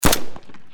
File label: Deagle